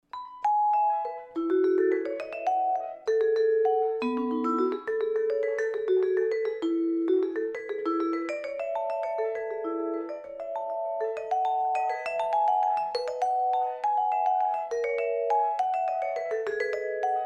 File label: Gigue, Violin Solo; J.S.Bach: Partitia for Violin Solo